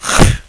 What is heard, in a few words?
spit; Exile III v1.0